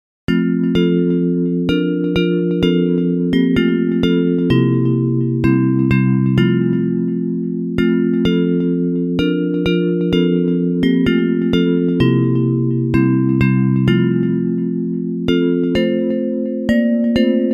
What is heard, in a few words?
Bells